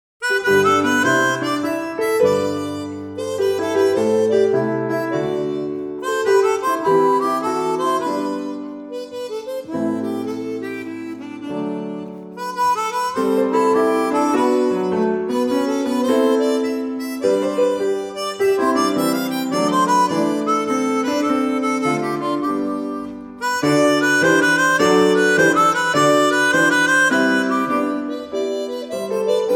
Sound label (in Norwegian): Allegro vivace, instrumentalsolist og piano; 3. sats fra Suite nr. 1 for instrumentalsolist og piano